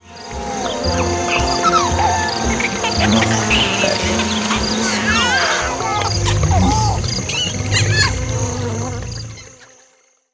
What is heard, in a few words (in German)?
Im Garten mit Originalgeraeuschen